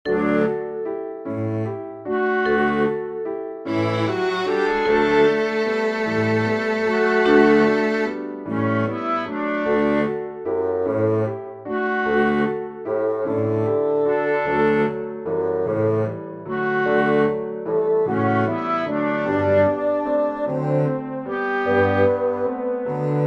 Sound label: barcarolle esque